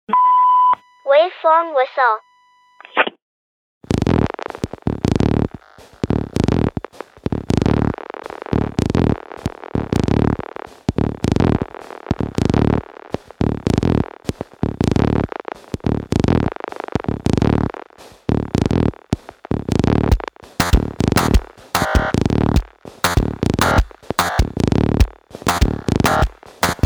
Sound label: Waveform Whistle